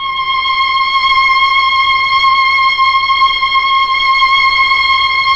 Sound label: STR, Melo.Strings, Slow Strings; Roland LCDP09 Keys of the 60s and 70s 1